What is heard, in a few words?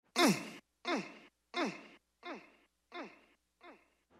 vox; Hip Hop /R&B instrumental